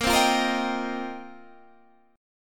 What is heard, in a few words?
F 7th Suspended 2nd Suspended 4th